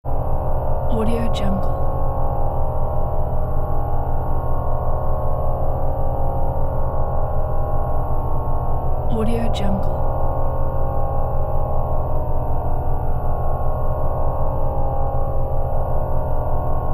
futuristic ambient

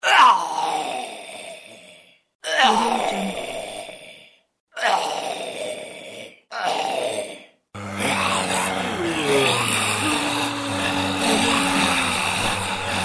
zombie sounds pack

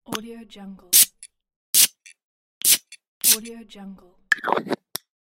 spray

